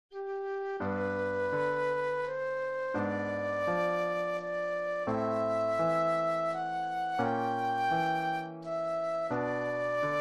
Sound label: Flute Solo; christian solos